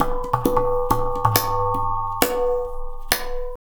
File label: Tabla, 135 BPM; Zero-G; Pure Tabla